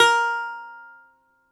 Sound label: BALALAIKA, Prima Balalaika, Plucked Strings